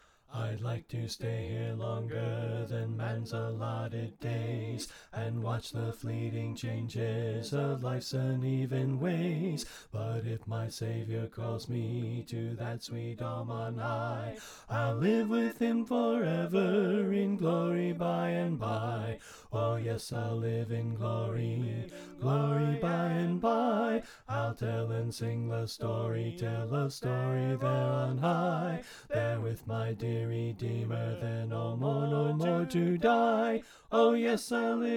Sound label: Bass Left